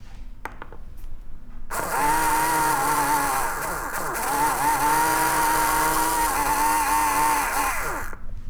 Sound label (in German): Kleiner Motor